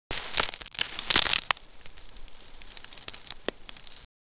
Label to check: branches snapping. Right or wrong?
Right